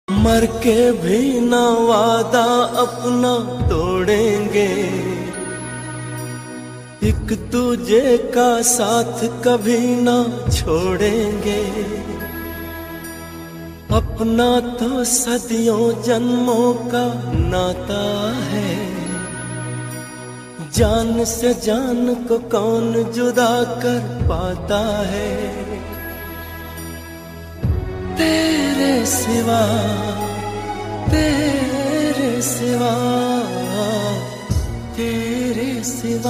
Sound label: Sad Old Hindi Song Ringtone